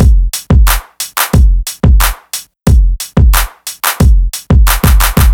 Ill Beatz, рэп

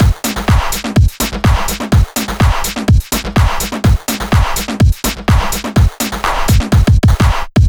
Italo Beat B

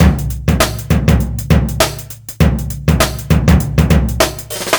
Old Drums, funk

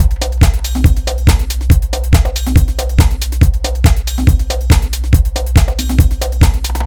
Subsonic

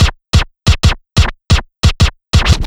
Old School Scratch, hip hop

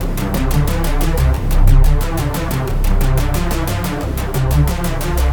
Attack, звук синтезатора хип хоп attack

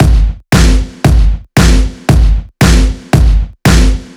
Hard Set, сэмплы ударных electro pop

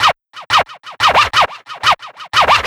Scratch

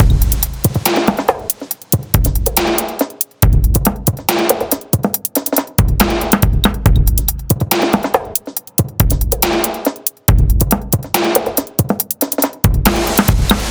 Thriver A, 140 bpm

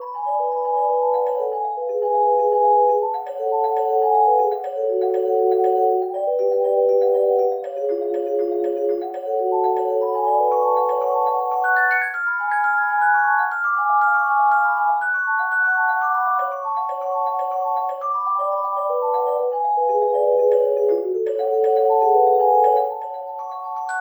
celeste, playful waltz

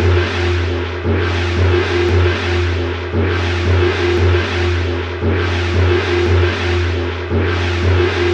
Samurai Cymbal 115 BPM; перкуссии percussion loops

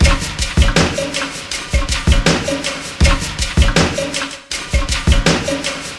Perc Rider; Ударные и барабаны (Downbeat); 80 BPM; Downtempo; Drums; даунбит

